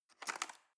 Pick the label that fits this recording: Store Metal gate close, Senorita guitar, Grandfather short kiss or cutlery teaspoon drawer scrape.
cutlery teaspoon drawer scrape